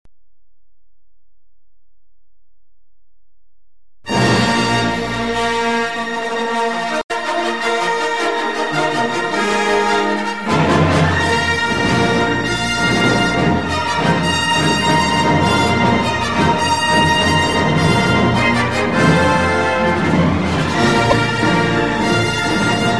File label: fanfare